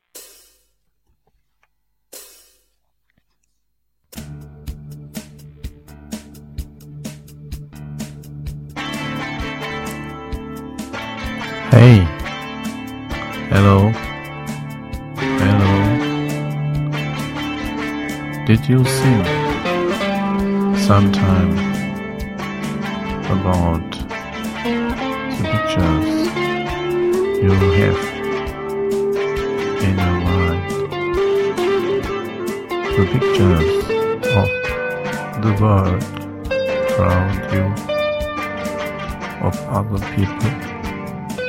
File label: drums keyb bass git rhyth git solo voice